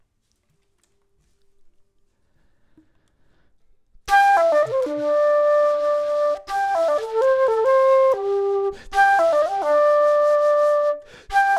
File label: flute; logic